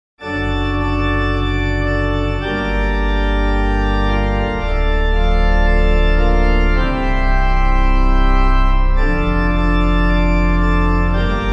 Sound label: organ, hymn; Come Behold the Bread of Angels; Hibbard; Clyde McLennan; Corpus Christi